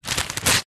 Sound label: household telephone directory yellow pages page tear out